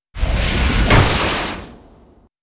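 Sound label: ice guardian attack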